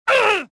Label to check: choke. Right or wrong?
Right